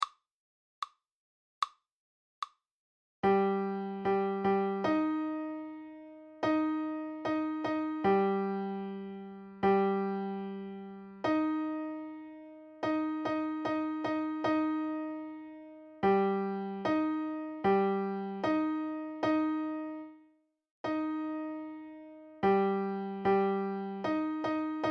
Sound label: minor 3; intervals